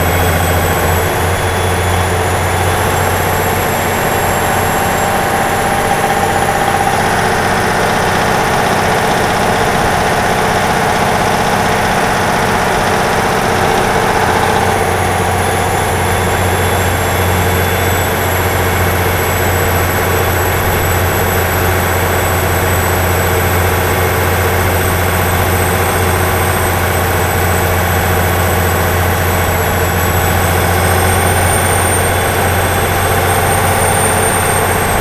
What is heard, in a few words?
amb crane motor; Terminator 3 Rise Of The Machines; XBOX